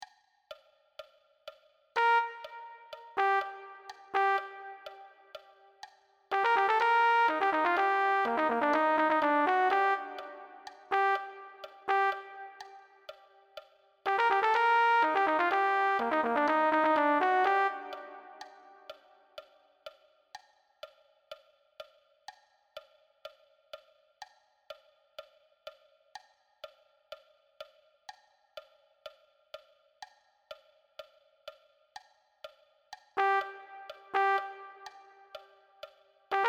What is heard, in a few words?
Trumpet